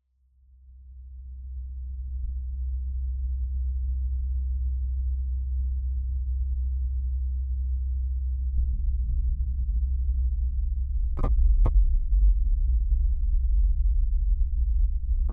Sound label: Quake, Mars